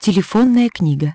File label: menu; Hyundai H-MP718